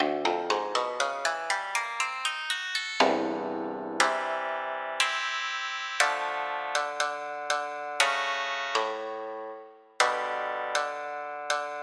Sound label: Shamisen; EverQuest